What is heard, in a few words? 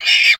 Ant hit squeel; blastmorph pinball; xbox series xs